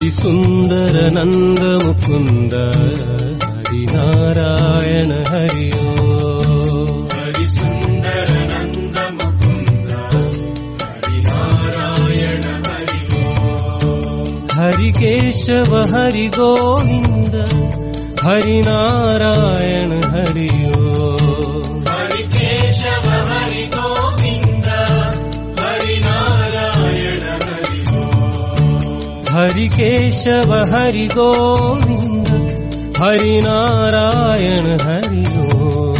hari sundar nanda mukunda bhakti song

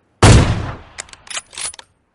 goli ki awaz awm fire sound

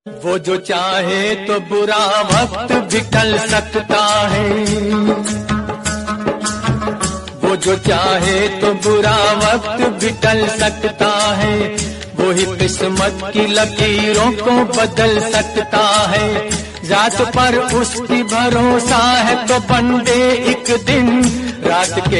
bhajan

